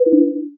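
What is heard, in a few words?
cancel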